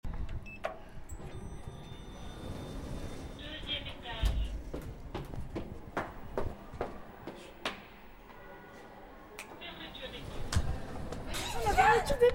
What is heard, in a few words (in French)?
BRUIT ASCENSEUR PAS